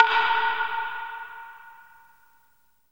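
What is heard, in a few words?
Japanese PERCussion; USB Soundscan vol.60; Asian Traditions; AKAI; JAPAN PRC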